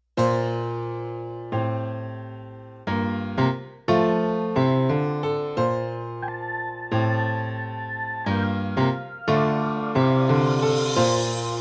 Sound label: backing